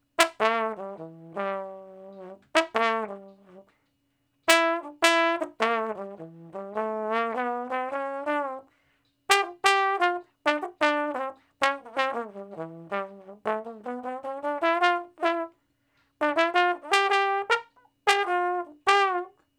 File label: Trombone Shuffle (G); Crimson Blue and Fabulous Horncraft 4 RnB; Horncraft for R&B; Solos